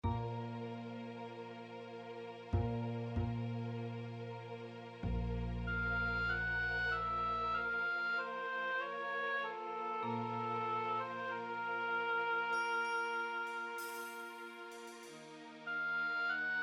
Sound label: The Music Box